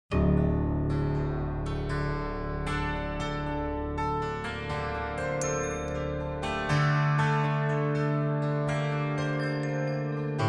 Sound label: Spiral Groove